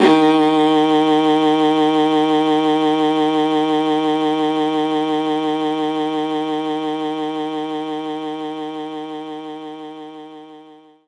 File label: Viola D#3